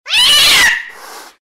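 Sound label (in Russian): кошка защищается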